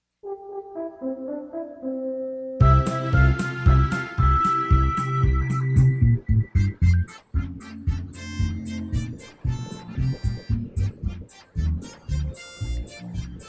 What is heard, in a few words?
Banjo